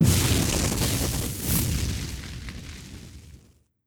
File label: Armor Strike Cast; Legend of Keepers